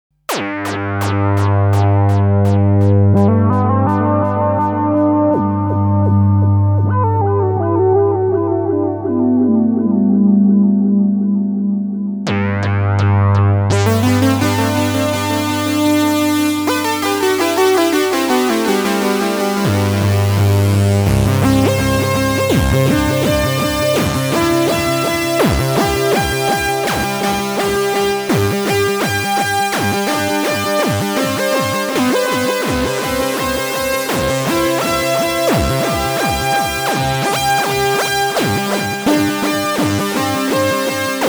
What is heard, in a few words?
Minimoog Solo Lead